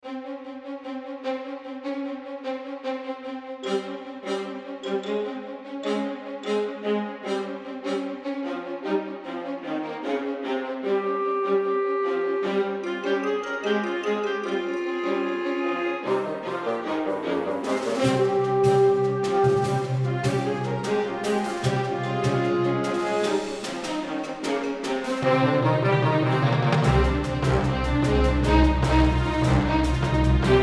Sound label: Music for action movie